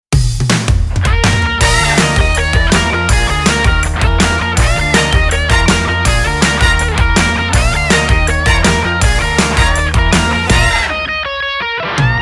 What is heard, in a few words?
exit music